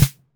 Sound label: Snare Pairs